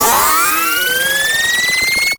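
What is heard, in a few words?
Sniper Charge Up Long; Venus Bipeds; Venus Railgun Biped Agent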